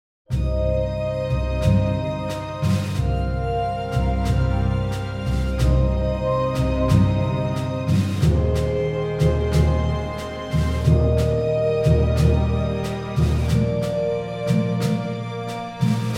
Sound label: Outcome Win; Falling Skies